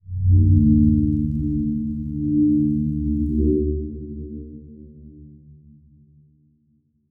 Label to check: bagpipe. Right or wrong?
Wrong